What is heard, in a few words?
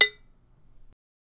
rim hit; Synthetic's Serum Collection; copycat plug